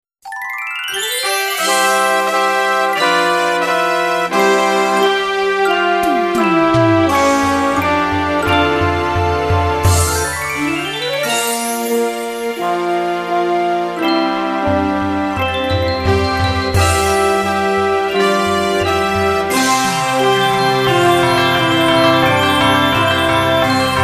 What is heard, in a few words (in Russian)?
fanfari novogod